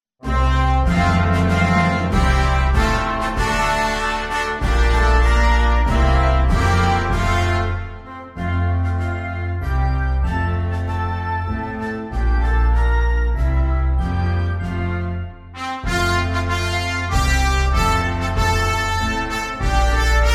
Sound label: Concert Band; O Christmas Tree